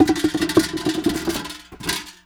Apples Dump Metal Bucket; SFX Kit; Foley - Misc

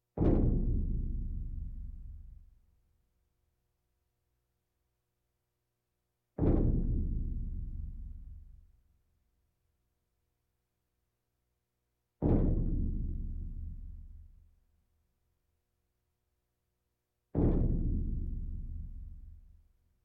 Percussion Bongos Tympani rolls Gongs Drums

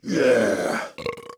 Crowd Cheering; Pitch Black Audio Pong

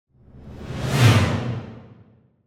Symphonic Hit Heavy Horror Final Chords - Single; Hollywood Edge; sound designer's toolkit v2

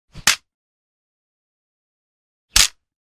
Sword Hit (Kendo) 2X; Hollywood Edge; Martial Arts and Human Impacts

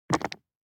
DICE on Neoprene, Drop, Standard, 6 Six Dice; Samples; ArticulatedSounds; DICE Sfx Party (Extended)

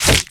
Melee Physical Medium; Kilta

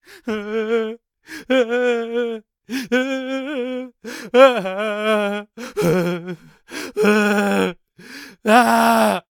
npc male scared; DeadFright

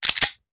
Hand Gun Foley; Sound Ideas; Guns

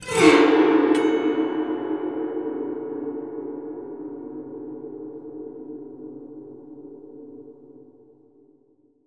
eerie hallway; Cinematic Metal Impacts

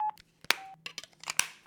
Cell Phone Beep Hangup; SFX Kit; Foley - Misc

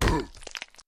zombie hit

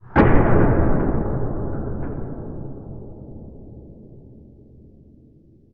DOOR Sci-Fi Large Slam Reverb; mono; Universal Sound FX; DOORS & GATES

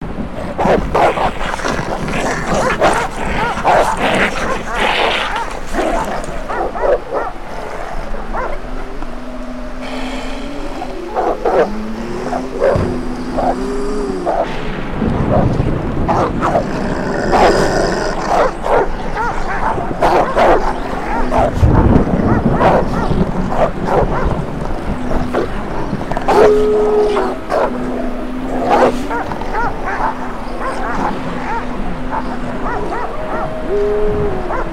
rabids dogs barking; Halloween Nightmare CD Sound Effects

